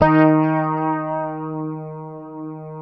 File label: fingered bass D#3; Sampleheads; Will Lee Bass Library